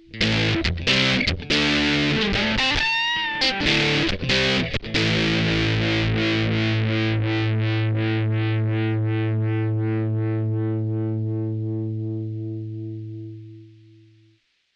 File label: Dist WDF, tube